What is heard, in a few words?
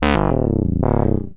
game over; The Best of Select Top 50 Volume 1; wildride